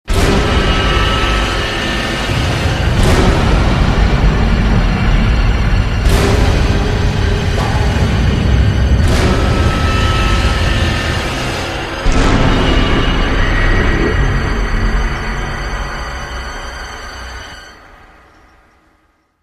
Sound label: Scary; Blair Witch Volume III The Elly Kedward Tale